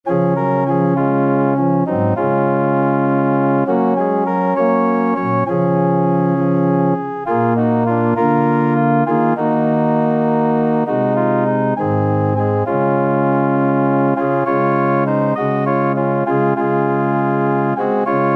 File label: organ